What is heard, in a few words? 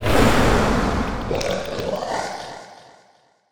Distant Absctract Scream Short; Monster Sounds; The Decline Of Warriors; Monster Sounds Volume II